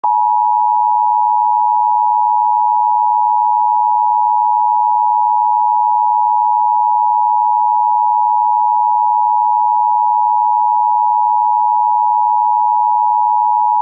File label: Emergency Broadcast System TONE